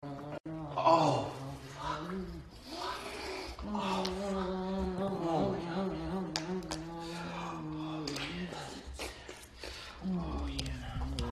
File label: suck, moans; The Witcher Hunt